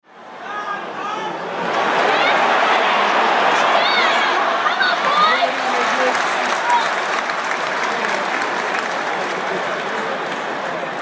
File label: MCG crowd roar